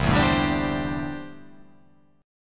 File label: C 6th Add 9th C down